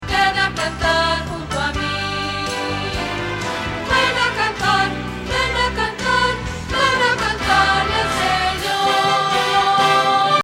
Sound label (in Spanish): Contralto